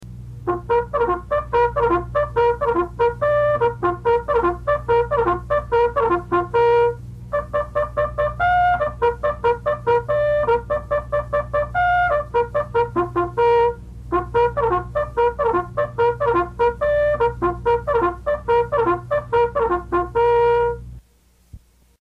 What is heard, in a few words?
Reveille, bugle calls